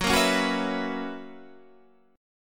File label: B Major 7th Suspended 2nd Suspended 4th Gb